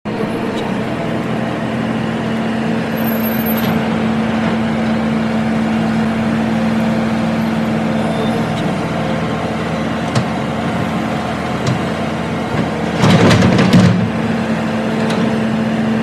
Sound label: excavator